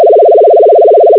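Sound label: chirp; carson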